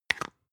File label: spice jar smooth close creak fast; industrie wirtschaft; gastronomie